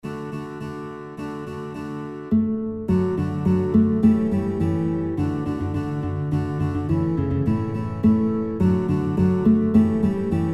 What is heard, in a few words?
Guitar, kinderlieder gitarre playalongs; Guitar Songbook; Auf Einem Baum Ein Kuckuck Sass